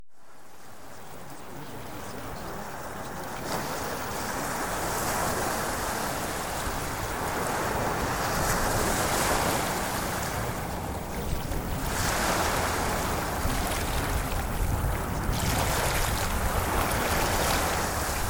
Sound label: Ocean Waves; Premium Subliminals